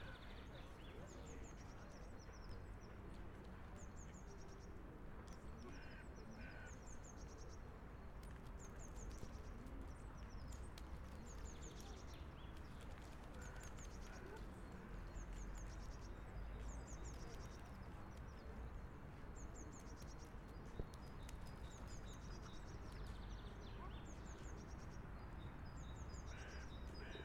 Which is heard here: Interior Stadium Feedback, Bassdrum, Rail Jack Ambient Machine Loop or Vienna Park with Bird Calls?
Vienna Park with Bird Calls